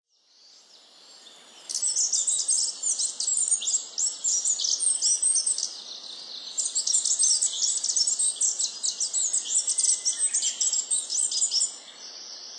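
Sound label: Dark-sided Flycatcher; nikko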